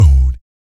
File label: VOC PERC, Vocal Planet Jazz FX BASS PERC